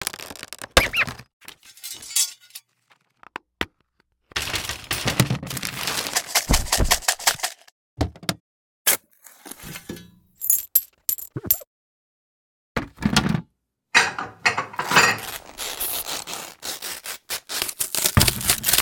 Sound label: Foley